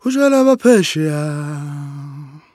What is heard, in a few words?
Vocal Chirp, African Voices